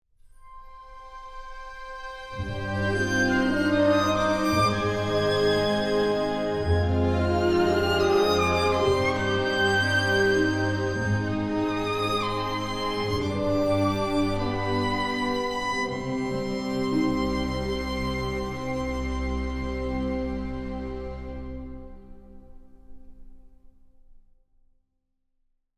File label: news sad bumper violin; CTV